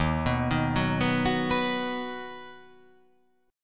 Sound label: D sharp Minor Sharp 5th D sharp; x,6,9,8,6,6,8,8; chordshape